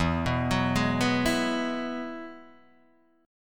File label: E Major Add 9th E down Guitar Open D